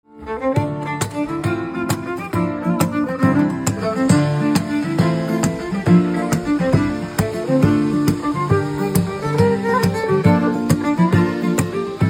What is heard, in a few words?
Violin Instrumental Ringtone; Pirates of the Caribbean